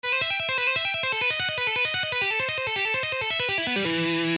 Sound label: Shredding Exercise